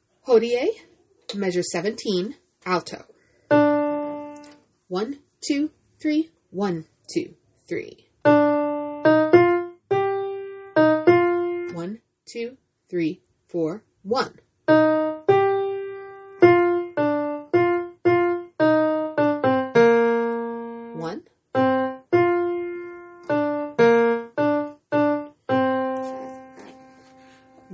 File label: hodie a